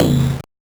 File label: Noise; musicradar; 8-bit bonanza